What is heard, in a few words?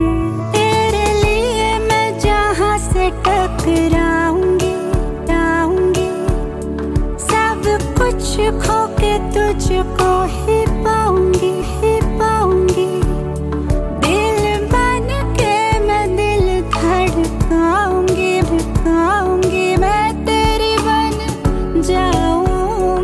romantic love ringtone